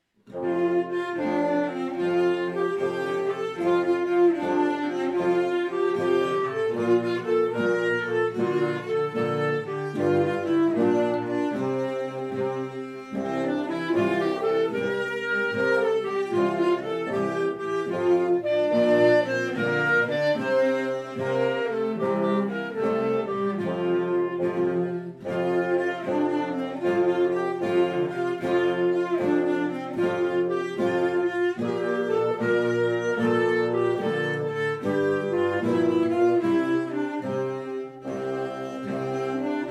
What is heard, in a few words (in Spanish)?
instrumental; los carteros de gamoburgo